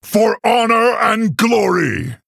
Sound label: Male Orc Attack